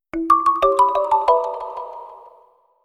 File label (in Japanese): サウンドロゴ; マイクロソフト; 不採用作品; I.E. 4.0